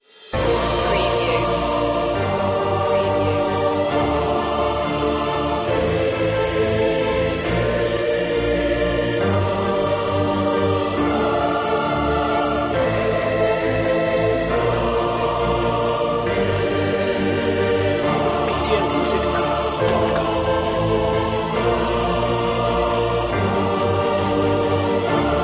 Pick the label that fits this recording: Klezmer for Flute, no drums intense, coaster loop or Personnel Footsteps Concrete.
no drums intense